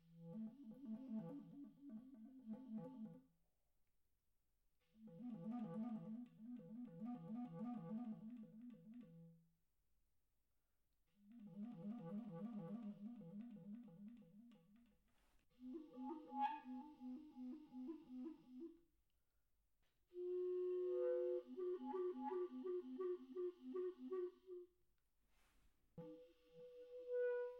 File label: low f sharp